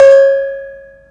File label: canang ibu